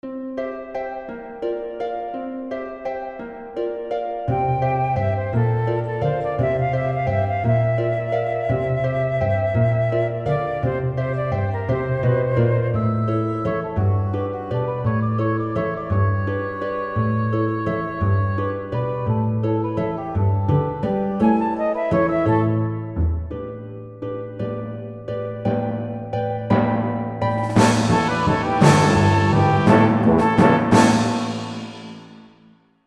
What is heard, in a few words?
Waltz; FFVII; Chocobo